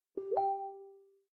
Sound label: Message Tone